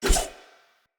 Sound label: Throwing Knives Throw; Weapons; Pothia